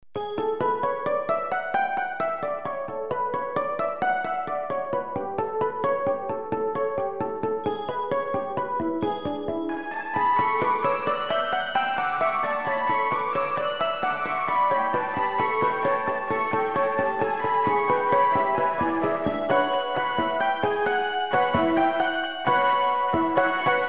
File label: Tamburello; guitar; soloduotrio